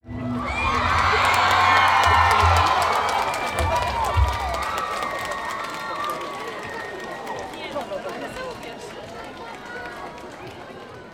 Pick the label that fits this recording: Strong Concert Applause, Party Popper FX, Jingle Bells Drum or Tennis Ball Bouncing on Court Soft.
Strong Concert Applause